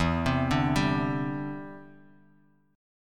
E Minor 7th Sharp 5th E down Bass Standard; arpeggiated

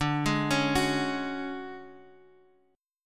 D Major 7th Flat 5th Guitar Drop D, chord

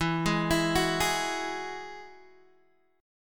E Major Add 9th, Guitar Open D

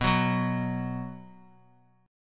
B 5th B Guitar, chord; strummed; JGuitar

